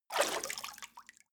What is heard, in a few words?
step water run out